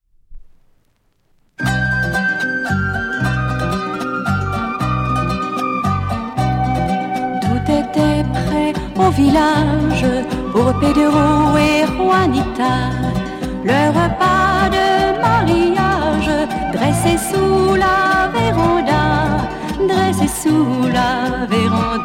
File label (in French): flute de roseau